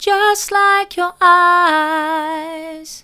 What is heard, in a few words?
mid, vocal